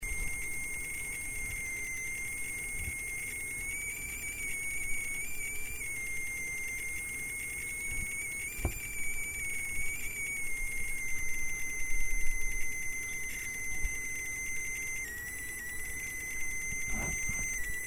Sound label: Stream Noise; ZEN